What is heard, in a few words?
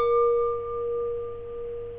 glass